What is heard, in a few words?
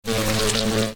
giant mosquito attack; mobs